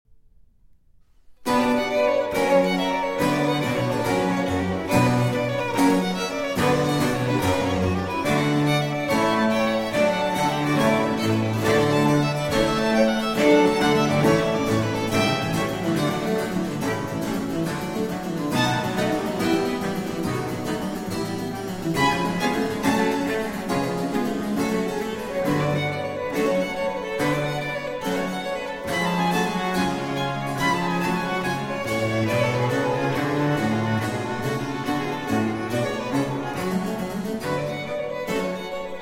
Sonata For 2 Violins & Continuo in B Minor, Presto